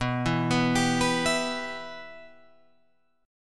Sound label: B Suspended 4th Sharp 5th B Guitar Open F; Bsus4#5 arpeggiated; JGuitar